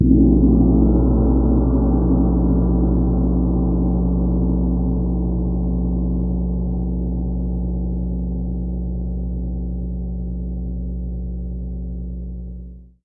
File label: Gong; 90 s Sample CDs; PowerFX - Downtown Orchestra